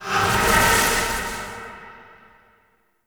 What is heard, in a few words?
Rifle Semi Mode Switch; Weapons; Warframe; Zariman